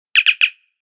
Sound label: chirp, communicator; TOS